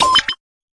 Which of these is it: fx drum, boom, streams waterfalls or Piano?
fx drum